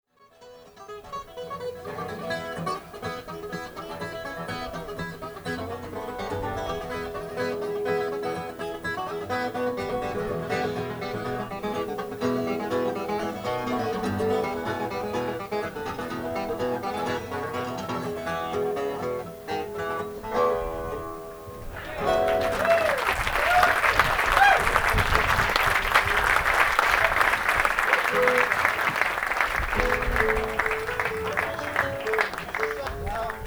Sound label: guitar; Bluegrass; Winterhawk Bluegrass Festival; Winterhawk 1993; Workshops